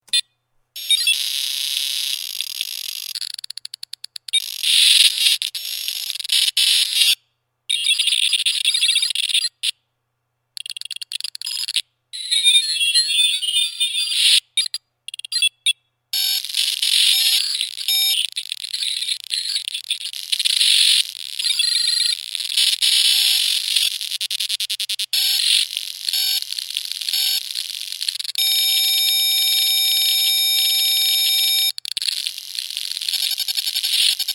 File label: Piezo